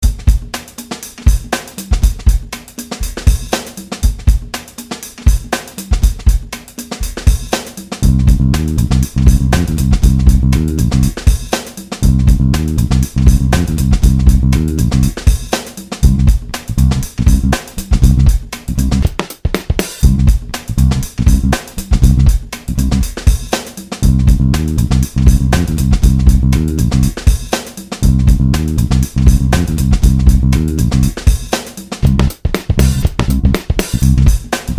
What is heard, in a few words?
Funk